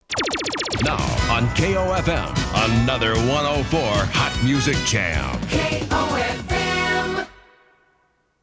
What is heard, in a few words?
Jingle Hotmusicjam; KOFM